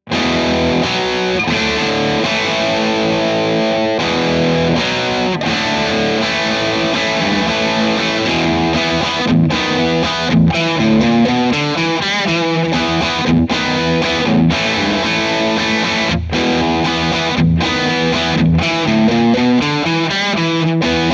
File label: rock chords; jensentone